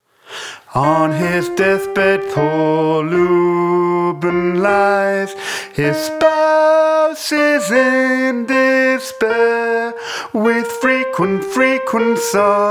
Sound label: Poor Lubin - sop; classical-traditional